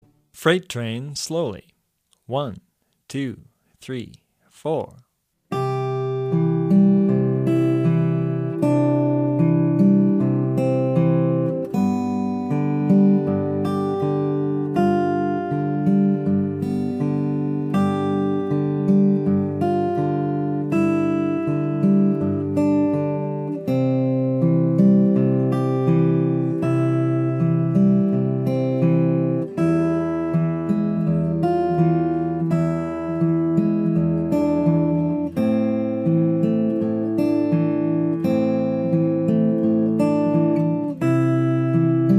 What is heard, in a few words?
travis picking